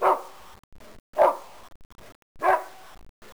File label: dog bark; tfc; fcf